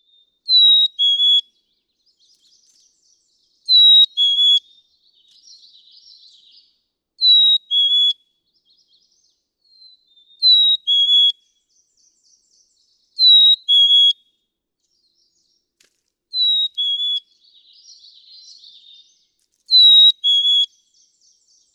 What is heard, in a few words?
Black-capped Chickadee